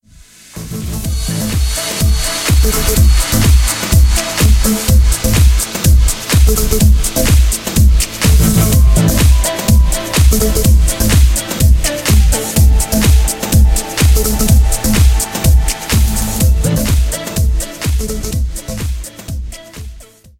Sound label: song; vex3